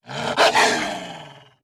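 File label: wolf, monster; lang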